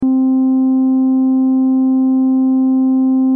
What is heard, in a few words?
Concentration loop Saturated Sine; phet io wrapper sonification